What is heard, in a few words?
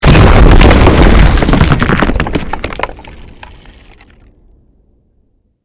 Explosion; cstrike